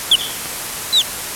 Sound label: barbastella beijingensis, bats; China bats